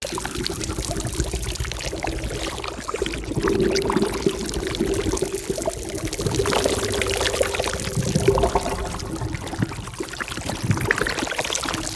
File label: Sludge Waterfall Loop; Encounters; Ode Pollution Tower